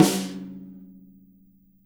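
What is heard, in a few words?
JAZZ KIT snare drum, Drums Acoustic; USB Soundscan vol.10; AKAI; JAZZKIT 2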